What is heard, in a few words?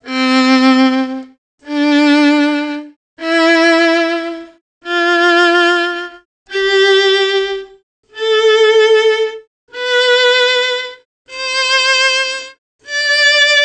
doremi